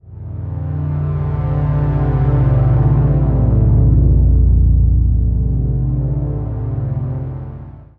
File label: ethereal ambient drone texture